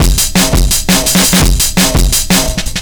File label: 170, Breaks; Drum&Bass; EZ Rollers